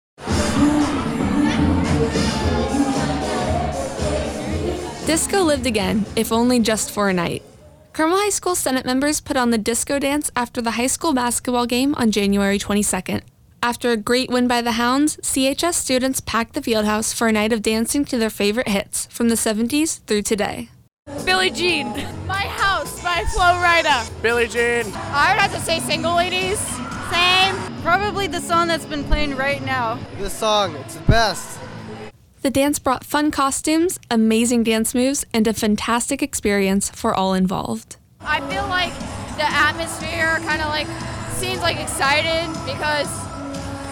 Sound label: DISCO DANCE